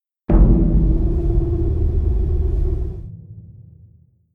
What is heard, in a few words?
demat interrupt, tardis; p00gie